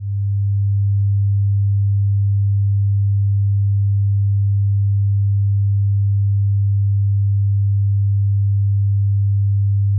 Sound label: Tone Sine 100Hz Stereo